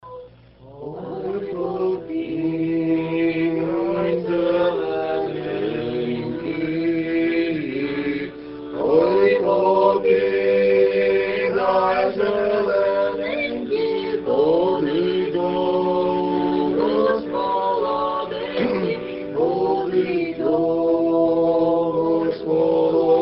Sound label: Ukrainian song; Theophany 2008